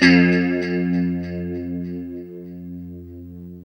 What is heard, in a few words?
LO E F 2, Guitars; Spectrasonics; Hans Zimmer Guitars VOL-1; JC-SHEEN